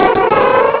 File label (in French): Cri